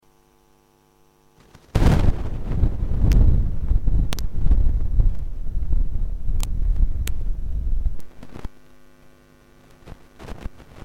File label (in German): Elektrodenlauschen